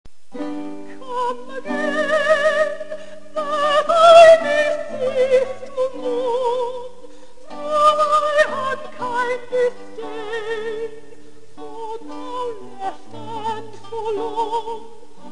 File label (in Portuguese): canções Dowland